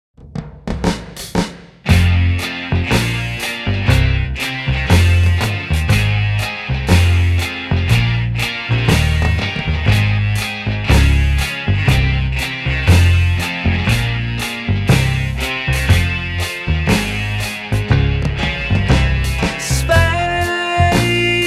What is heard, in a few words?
Vocal Test